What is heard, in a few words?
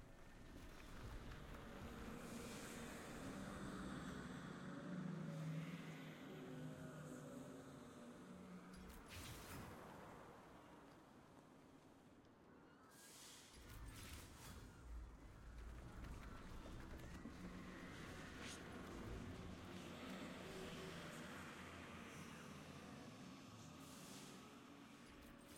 amb piltover zaun piltover streets ruined